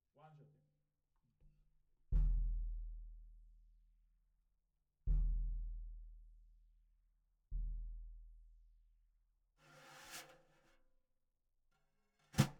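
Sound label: Snare Bottom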